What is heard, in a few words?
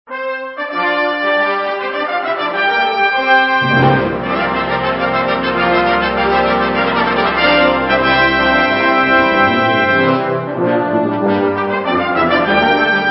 Fanfare Theme, Polished Brass